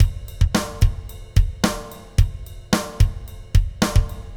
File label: 80s pop beat; Garage Band